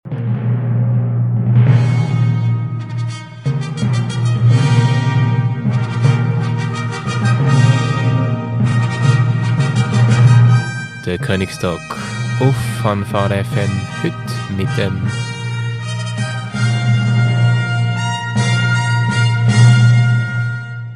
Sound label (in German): jingle; Koenigstalk